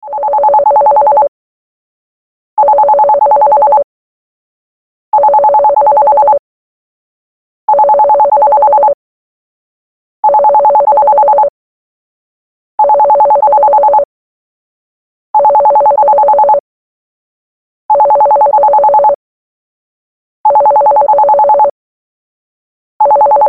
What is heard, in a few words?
landline phone ring ringtone